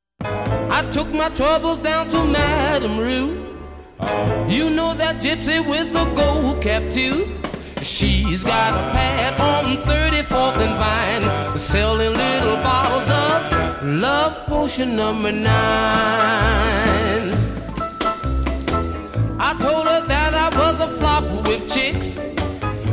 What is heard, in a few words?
doowop sounds; 1950's